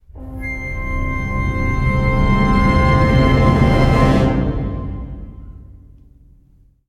Stingers

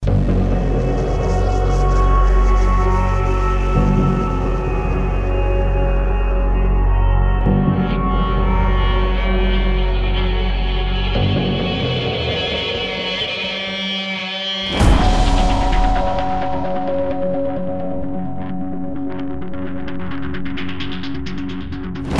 Mellow Combat; Crack of Eon

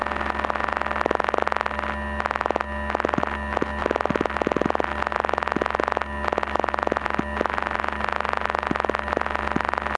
pop static; five nights at freddy s fnaf 2

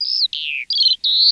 woodlark; CS Level2

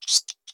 rat sqeek; ice age scrats nutty adventure

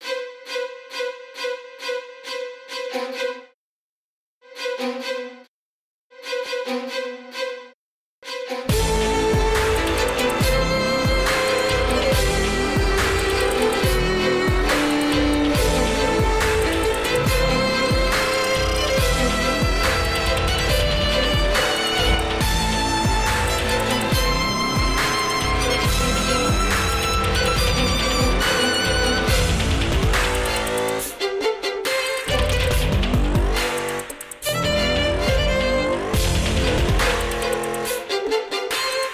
Scary Music; Hunters and Props

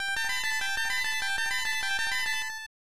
Hermit's Trumpet; Tengai Makyo Zero; Far East of Eden Zero; SNES

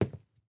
footsteps WOOD

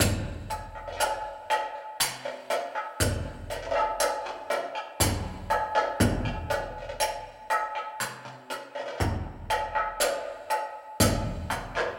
BGM music start intro; Babylon's Fall

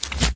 Footsteps; SFX Character

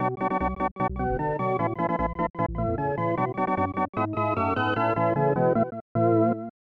Fanfare Happiness; super bases loaded 3; snes

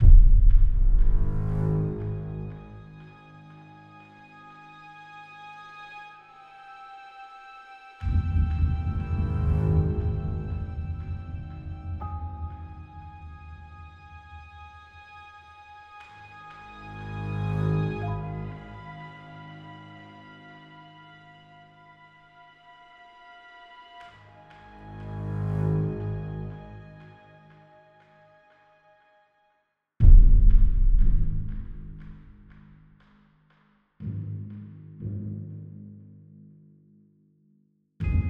Deadly Ambience; Arma 3 Reaction Forces

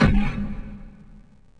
bounce panel; stardunk ios gamerip

